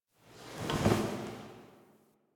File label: Idle Sit Down; Ambience; Warframe; Entrati; Tagfer